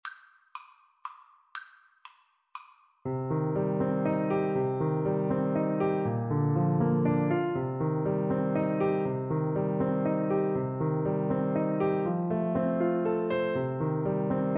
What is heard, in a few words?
scarborough fair, Trombone